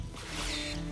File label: Phasers; trek